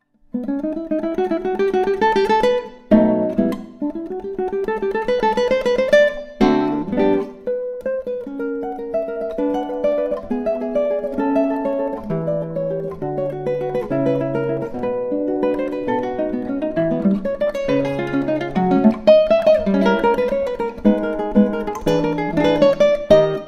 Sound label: Tarantella